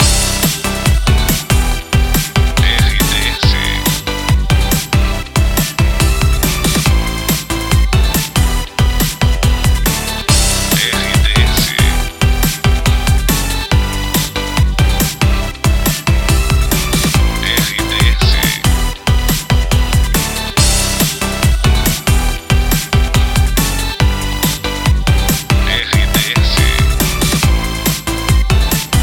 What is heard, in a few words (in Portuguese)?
TRILHA PARA LOCUCAO FM; 107 FM